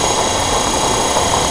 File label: door move; movers